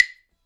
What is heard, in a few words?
CLAVE, Percussion; InVision Interactive; Percussion Slam; SULTAN